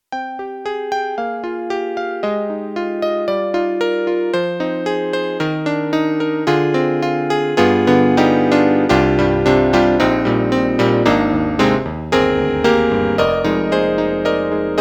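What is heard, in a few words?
Electric Grand; YAMAHA MDP-5 XG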